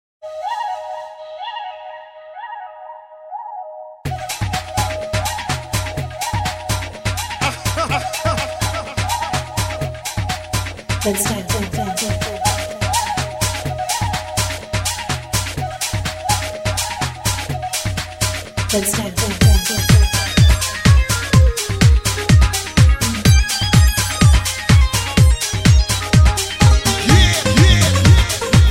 flute bihu mix

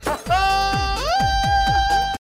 Laugh

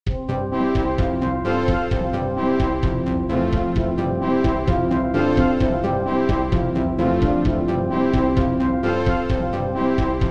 sms MIDI

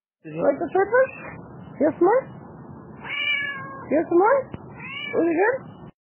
Cat wants swordfish

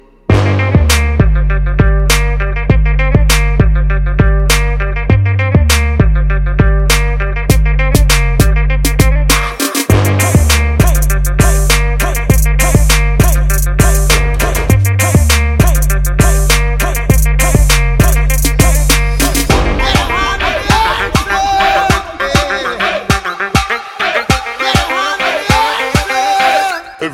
Beat

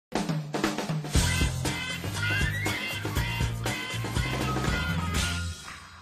Scene Transition

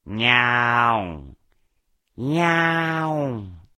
miau

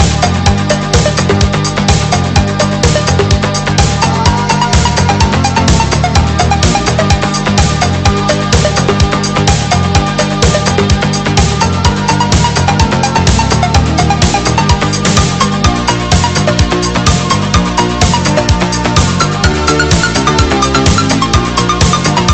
Loopable

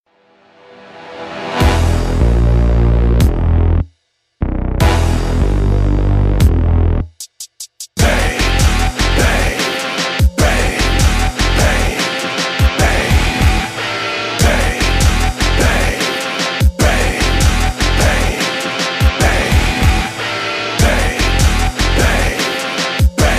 techno, ringtones; electronic

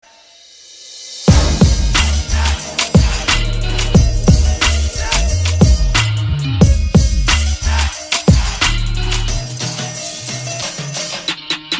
Albania Cifteli Shqipe

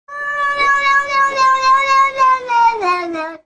talking funny cat says no no no

